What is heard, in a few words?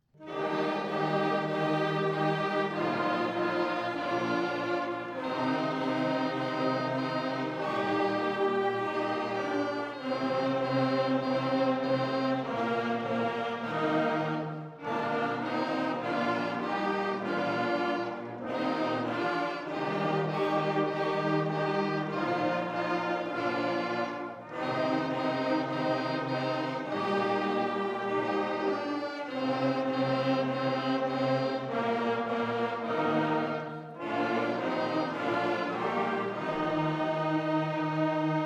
Jolly Old St Nicholas